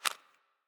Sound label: Phone close